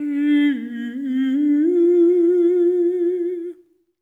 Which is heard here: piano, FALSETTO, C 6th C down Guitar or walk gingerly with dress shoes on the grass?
FALSETTO